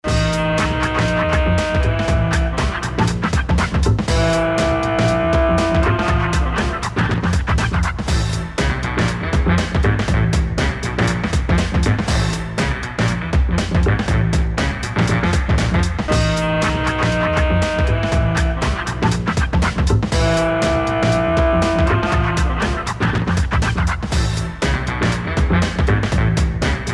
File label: Disco Dance Platform; Luigi's Mansion 3